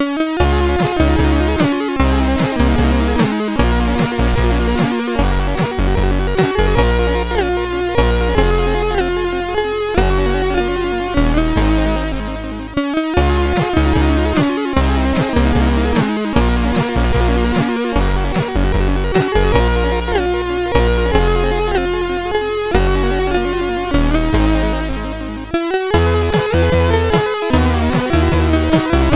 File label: Slow Melody, sid CSG8580R5